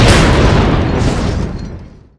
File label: Explosions Total Destruction of War Machines